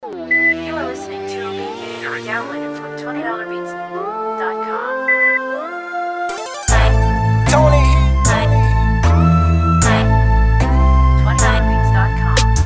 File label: Trap